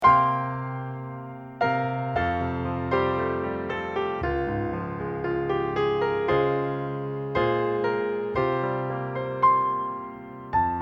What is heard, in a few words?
accomp